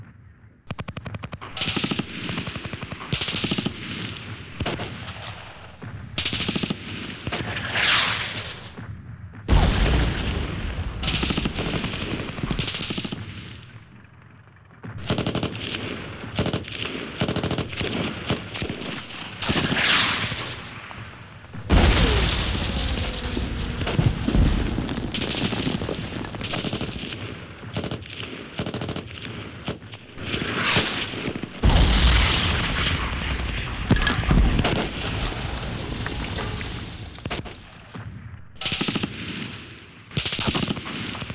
ambience; dod; de dod caen

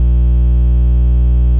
60Hz Hum

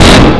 beam start

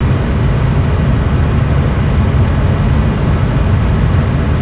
tech ambience

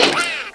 kill seagull

